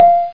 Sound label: beep; ProOne: Netcracker Netscape Navigator